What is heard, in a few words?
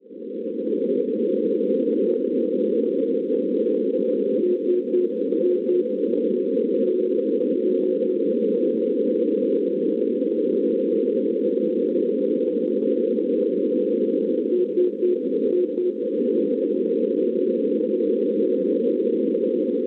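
NDB non-directional beacon, 410 kHz; SI; Salisburgo; Austria; Schwarzsee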